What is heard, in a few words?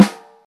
Snare Single Hit G Key, snare tuned to the G note. Loudest frequency: 590Hz